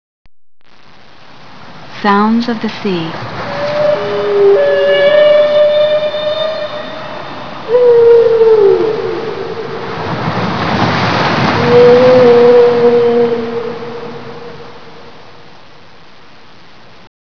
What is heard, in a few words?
ocean sound